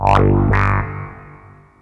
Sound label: Sweep Bass, Drum & Bass; Fluid Dynamics